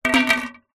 tin small empty no lid down on hard ground; foley; zapsplat

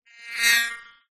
cartoon fly pass by; zapsplat

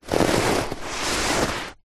hand movement scrape carpet; zapsplat; foley

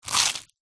human eat bite toast single

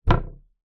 impacts wood panel loose hit vibrate; zapsplat

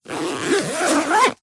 foley luggage rucksack backpack pocket zipper; zapsplat

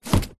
foley cardboard box small set down hard; zapsplat

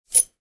instant coffee granuals tip in cup from teaspoon; food drink

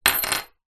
sport whistle metal place down on wooden table

